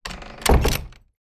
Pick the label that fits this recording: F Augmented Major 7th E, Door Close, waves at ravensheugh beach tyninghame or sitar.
Door Close